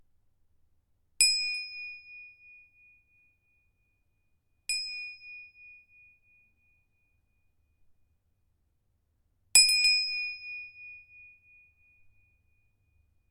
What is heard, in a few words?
Lama Bell